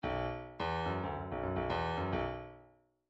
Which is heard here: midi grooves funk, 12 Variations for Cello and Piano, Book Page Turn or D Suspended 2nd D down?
midi grooves funk